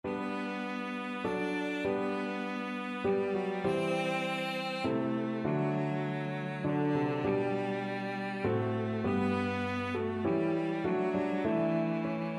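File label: violoncello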